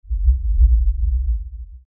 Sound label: Lfe Rumble By Swell Low Bass Movement; Blastwave